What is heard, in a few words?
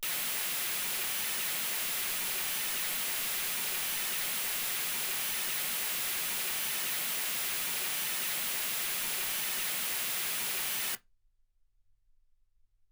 mls 48kHz